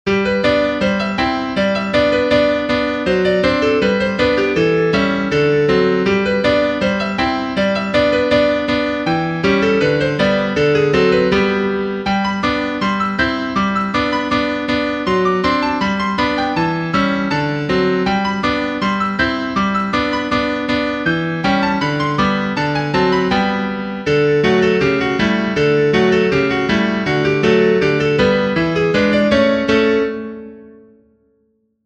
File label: PIANO